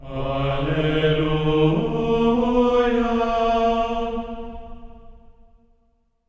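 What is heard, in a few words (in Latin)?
alleluia D#4; Spectrasonics; Symphony of Voices; GR ALLELU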